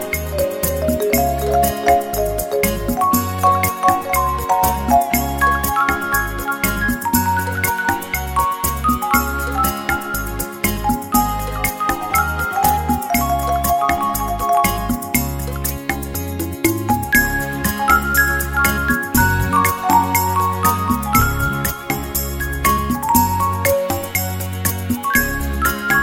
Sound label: Beguine